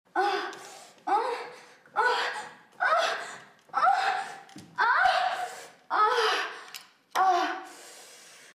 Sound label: cum, moans; The Witcher Hunt